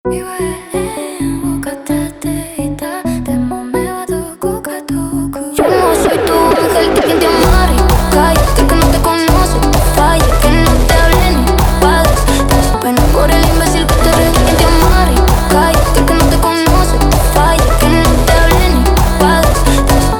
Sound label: Jumpstyle